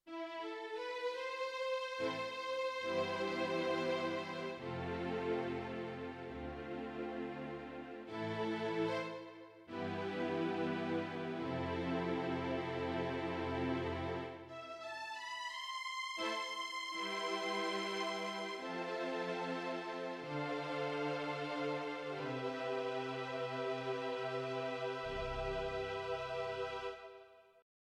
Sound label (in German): Streicher